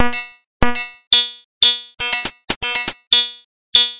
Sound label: 120 sharp n bright analog synth rhythm